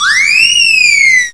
sirens down

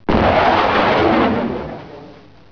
jet

